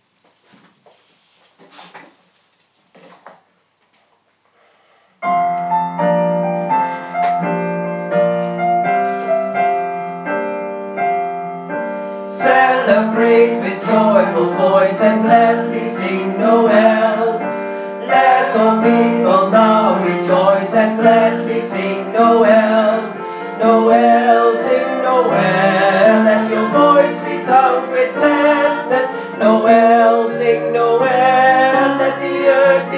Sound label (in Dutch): alten